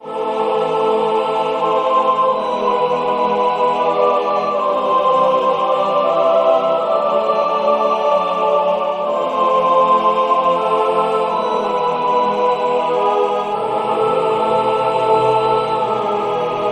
Church

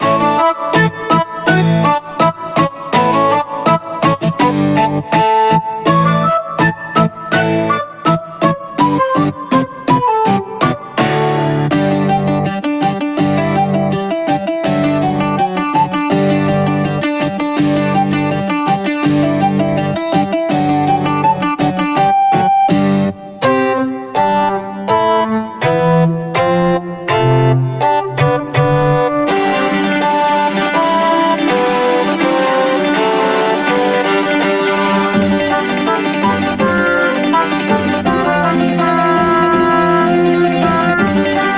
Bgm Fantasy

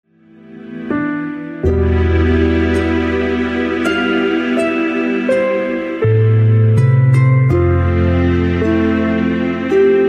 taiga; minecraft

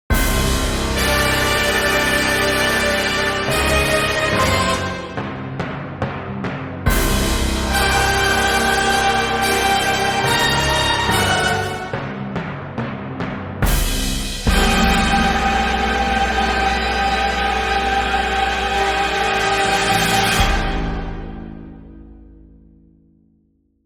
dragon fanfare only

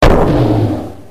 Thunder

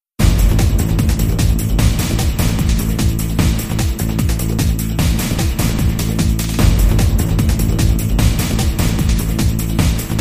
boss battle

